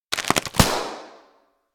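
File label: electronic kigurumi pop bachiin; anemoi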